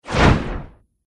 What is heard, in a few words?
Cartoon Dragon Wing Flap; Monster Sound Effects